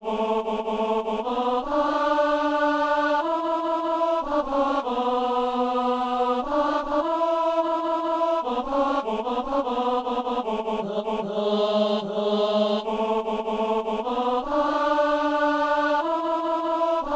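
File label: tenor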